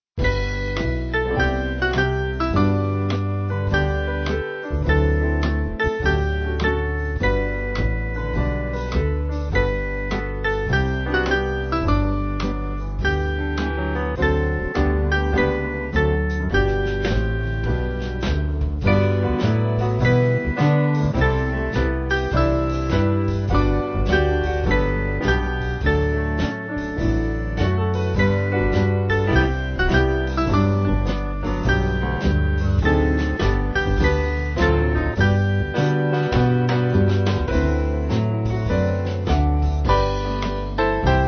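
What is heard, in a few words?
Band, Music Backing Files for Churches; Pass Me Not; Doane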